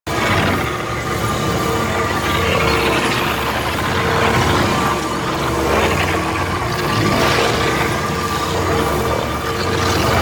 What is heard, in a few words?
Defense Mote Loop; JadeShadows; Ascension